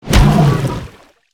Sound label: creature flinch swim; pinnacarid